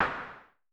SN Filtered, Vermona Mono15 Drums Snare

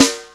Snare, Percussion Hits, Snares; Jungle; Zero-G Jungle Warfare 1, 2 & 3; 90 s Sample CDs

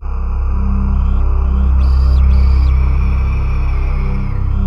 WS DA C 2, Korg Wavestation; Back In Time Records; Korg Universe VOL-1

